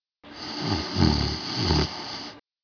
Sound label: sleep female; hotel giant demo